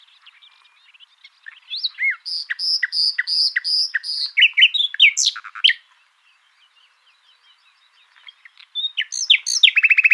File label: call, Nightingale, full song, adult male, The song phrases of this bird are longer than the previous one. Background: Nightingale song.; luscinia megarhynchos